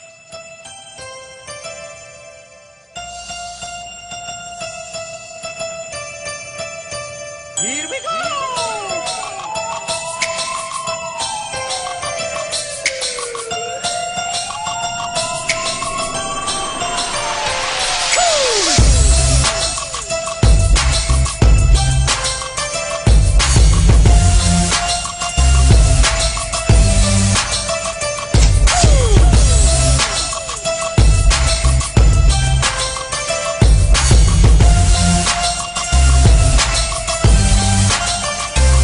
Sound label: christmas hip-hop remix